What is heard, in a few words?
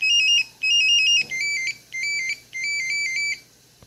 ringtone